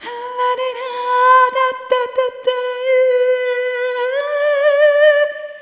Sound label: Arrhythmic G; Sonic Foundry (Sony Creative Software); ILONA